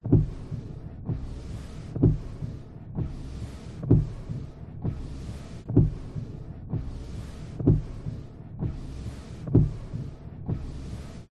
suv windshield wipers internal nissan patrol

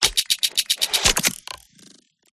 CYBER TECH; PMSFX

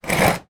foley glass jar scrape on metal; zapsplat

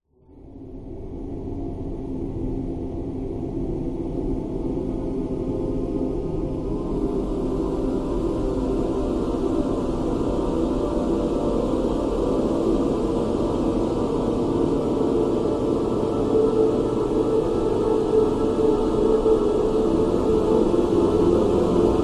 horror pad atmos dark sinister evil ghostly choir

